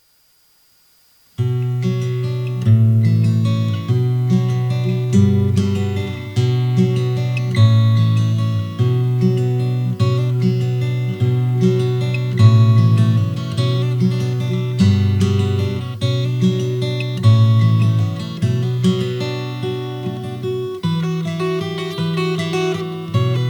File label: d-28 custom, guitar